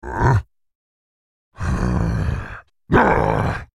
Maokai laugh

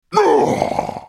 ultimate effort; Galio; Character Voices - English

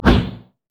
deceive; jester

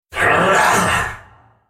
Droid spellcast effort; Khazix; Character Voices; English

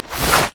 basicattack draw; LoL; varus

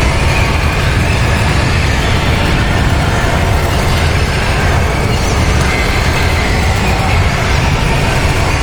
ravenous flock loop; LoL; swain

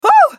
spell cast effort, en US; Caitlyn; Character Voices - English

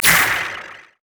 voidwalker forcepulse; kassadin

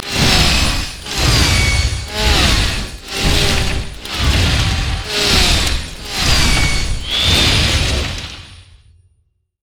rammus tremors; robo rammus

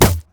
minotaur basicatk; LoL; alistar

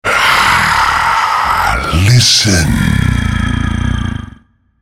ultimate effort; Rengar

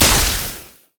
basicattack; LoL; varus

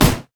red mech melee basic attack; minions

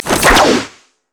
wildcards; LoL; twisted fate

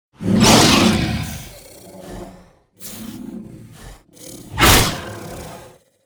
worm agitated idle; LoL; monsters

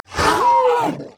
wolf angry; LoL; monsters

